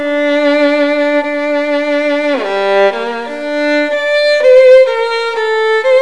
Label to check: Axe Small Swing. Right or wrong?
Wrong